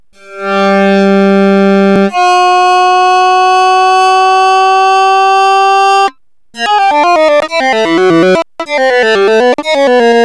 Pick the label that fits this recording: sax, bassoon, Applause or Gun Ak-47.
sax